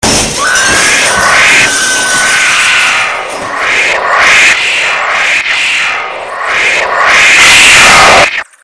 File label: spider scream